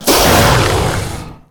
Giant Anaconda Death

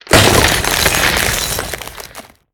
Ice Elemental Death

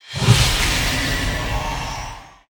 Nature Magic Underlay Death

